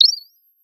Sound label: Sound of a bird tweeting nicely